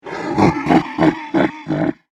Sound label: Horror, Devil, Laugh; Evil Laugh Sound Effects; Evil Laughs (Sound Effects:Horror)